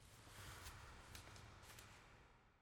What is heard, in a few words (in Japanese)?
温泉 (しゃがむ)